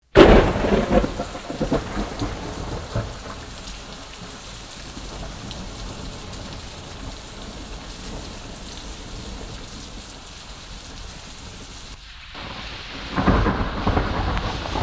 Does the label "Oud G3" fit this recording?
No